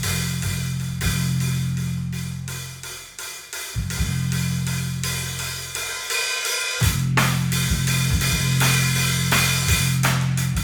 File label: drums